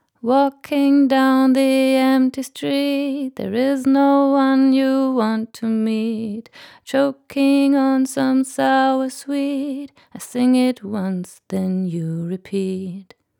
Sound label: Rode NT1 Vocal RME FF800 EQ